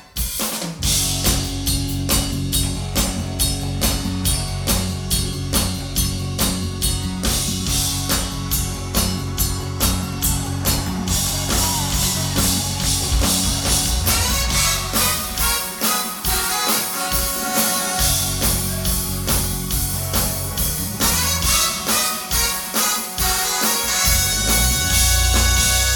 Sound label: Summer Horns Intro